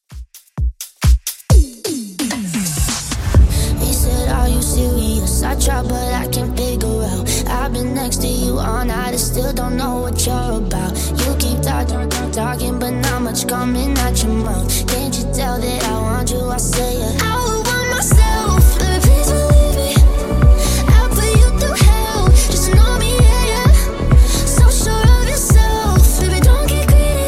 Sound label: Top40 Bootleg 130bpm